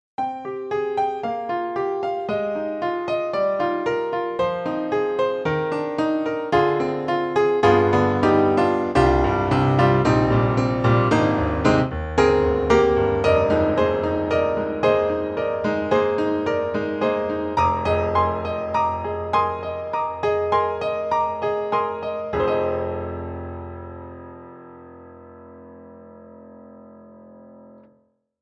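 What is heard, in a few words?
Berlin Brite Grand, piano; KORG KRONOS 2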